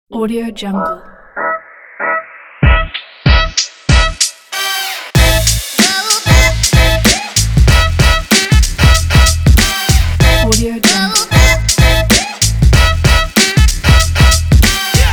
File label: hiphop